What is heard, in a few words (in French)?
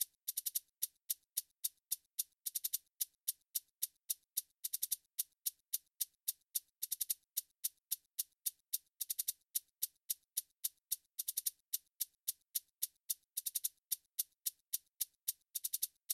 bolero cubain maracas